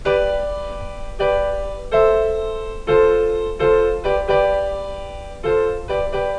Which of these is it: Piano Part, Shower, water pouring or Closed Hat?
Piano Part